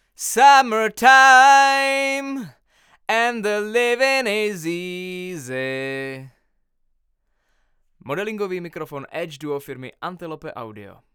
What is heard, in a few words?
Vocal - C414 Omni; omnisphere (koule)